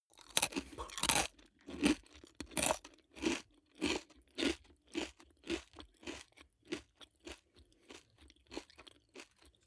eat large potato chip mouth closed

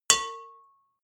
bowl hit

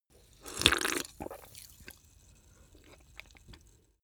drink soda can small slurp

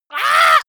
monster painful cry high

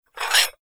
stacking small plates

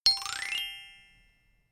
notification glockenspiel slide up hard

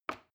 plastic button small release heavy

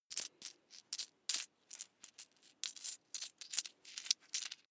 coins search push

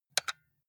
console controller xbox xyab press hard

